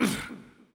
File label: player grunt